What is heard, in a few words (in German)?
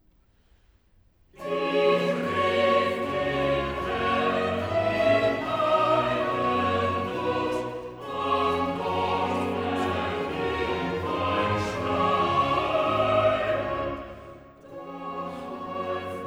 Choral; Cantata, BWV 117 'Sei Lob und Ehr dem höchsten Gott'; Bach; Warwick; 4th Sun After Easter